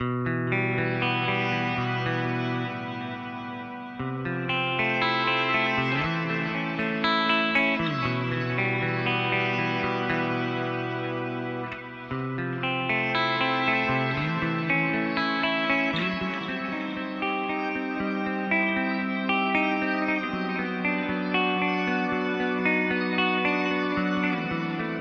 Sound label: Shimmer Delay; Boss DD8